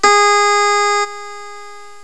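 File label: HARPSICHORD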